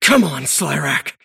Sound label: move pain; davion; dota